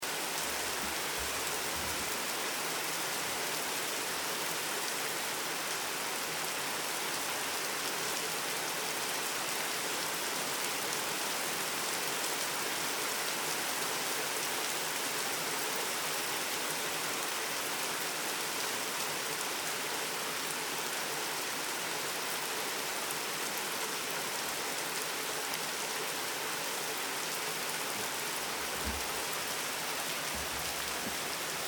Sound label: rain